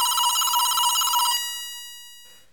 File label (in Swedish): ringljud; bamse; slutet